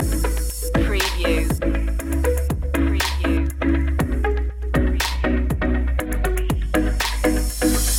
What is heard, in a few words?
musical notes loop; media music now